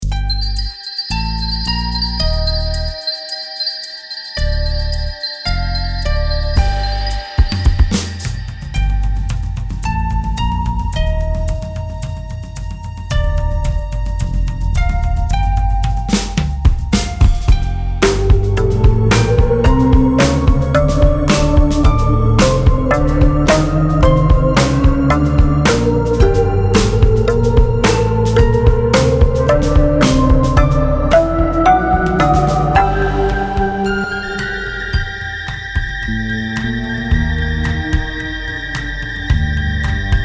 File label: Creep Beat